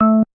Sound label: bass, programmable speaker